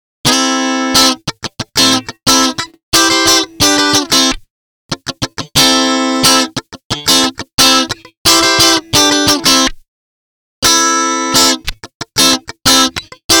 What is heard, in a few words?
guitar test; zoom ams